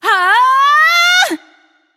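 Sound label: nonverbal battlecry; smite; voicepack; izanami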